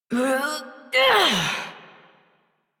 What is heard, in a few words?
Exertion Adult Operator; Warframe